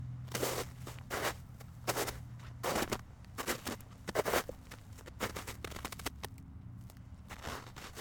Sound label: Snow Walk